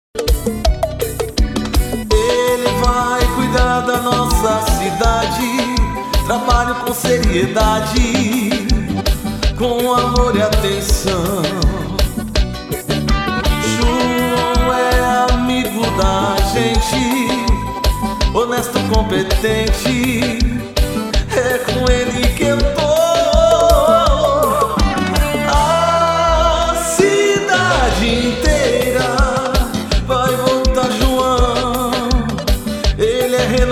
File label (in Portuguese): jingle